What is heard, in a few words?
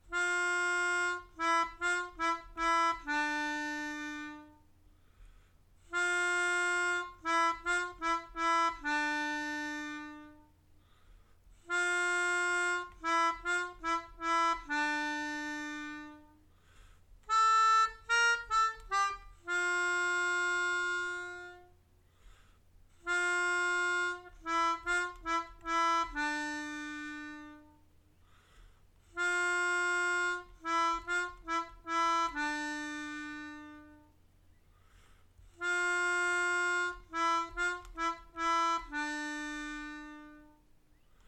Melodica